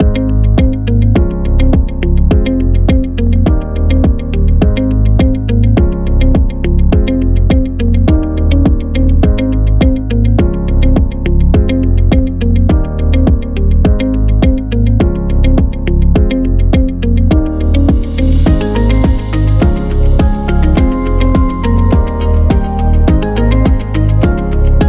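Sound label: Healthcare, motivational corporate music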